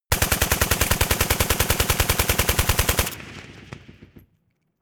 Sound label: rifle ak-47 exterior fire automatic; schusswaffen